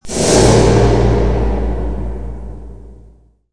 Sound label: metal; modern warfare 2; amb elements